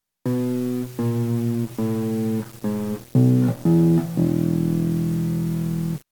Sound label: bass GUITARS